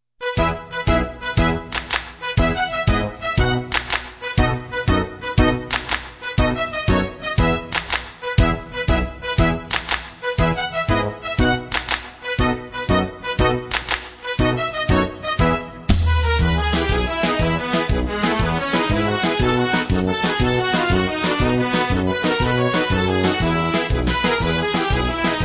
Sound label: Mexican Hat Dance